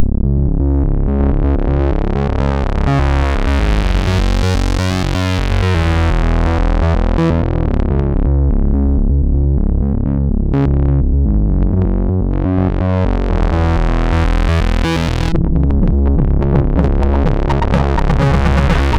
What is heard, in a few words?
Filter Crap, MOS6581R2; sid